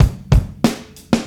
Baby rock 93bpm, Hip-Hop Street Beats; Sonic Foundry (Sony Creative Software); Hip-Hop Street Beats Construction Kit; Street Beats by Poogie Bell

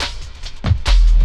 LOOP SD; Ueberschall; Drum & Bass Resonance; 140 BPM

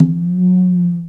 SP1200 CONGA; Greytsounds VOL-1

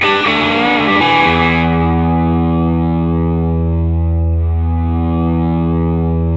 SOLO E, Vintage Blues Guitar; USB Soundscan vol.22; AKAI; SOLO E125

